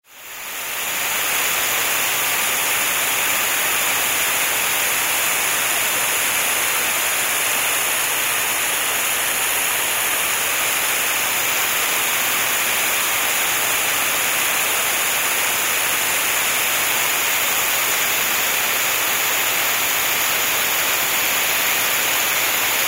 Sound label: hose is pouring water